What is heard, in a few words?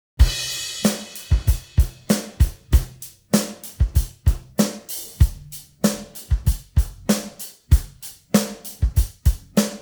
pattern, drums